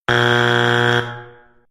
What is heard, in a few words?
red buzzer; got talent